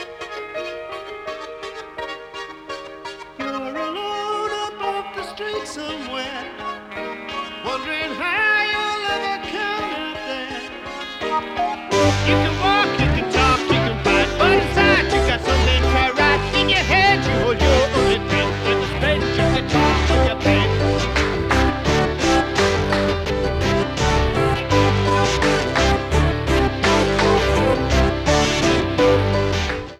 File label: Guitar And Pen